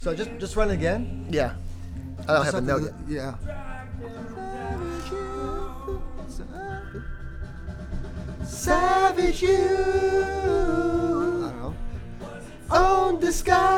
VOX Back

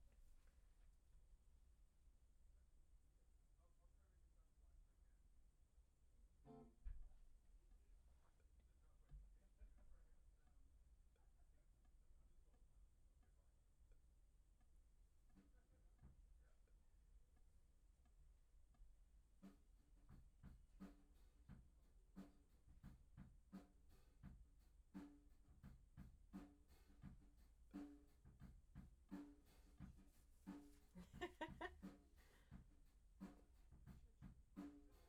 Act Guit L

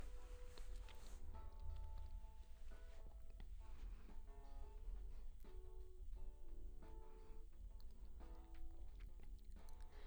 LdVOX Double

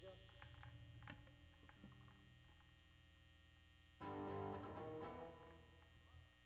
Bass

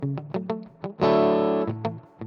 GTR R84

